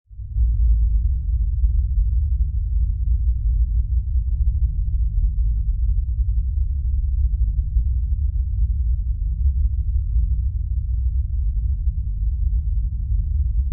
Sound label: Industrial Deep Rumbl, factory